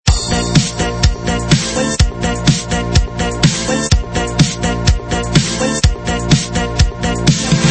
MIDI; BQ Russia BQM 1401 Monza

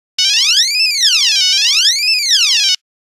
Nokia Buzzer; Windows Phone apps; 1100 Dizzy

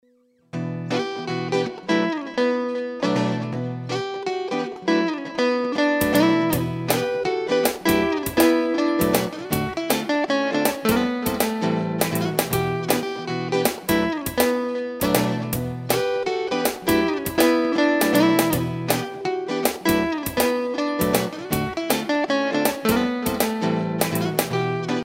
Hiphop, ringtones; Okapia; Spark

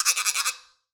Imitation, notifications; ZTE Axon 20 5G

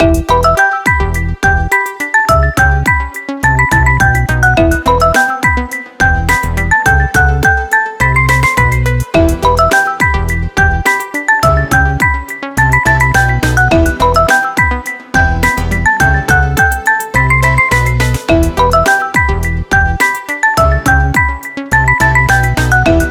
Wooden Perc, ringtones; Fly; IQ4406-ERA-Nano-6

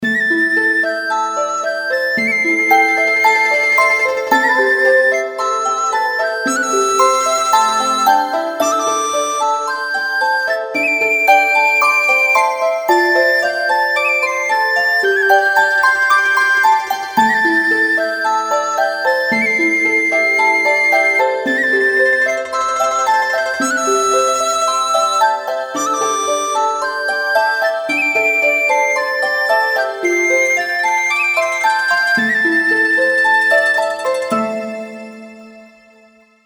Ringtone; Gionee GN137

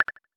Lock

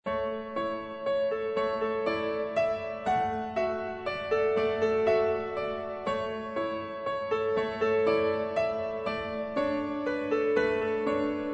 Alarm Cloudy Background, 天气动态闹钟dynamic alarm; Xiaomi MIUI 12.5 China; 多云Cloudy; MIUI12.5系统音效全收录

